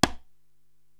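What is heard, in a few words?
Conga Hi Muted Slap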